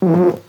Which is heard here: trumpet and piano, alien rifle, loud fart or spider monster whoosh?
loud fart